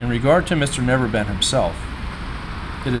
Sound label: noisy